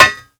foot metal, footsteps; Call of Duty Modern Warfare

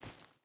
footstep barefoot forest walk; Rust

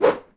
Axe Swoosh; Civ4 warlords

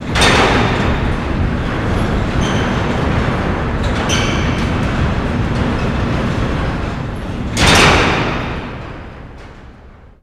door hanger metal open; Call of Duty Modern Warfare; Doors

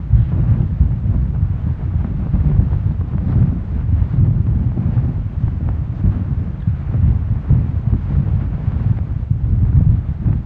explosive objects; World of Tanks

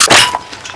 wp foley fal reload clip out

